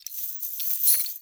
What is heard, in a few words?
Bird Body Shake; Ambience; Bird Three; Warframe; Entrati